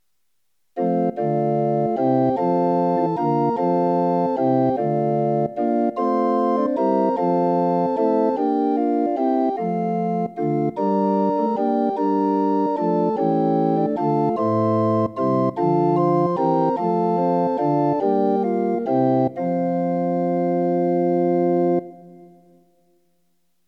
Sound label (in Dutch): Hymne; Ons is geboren een kindekijn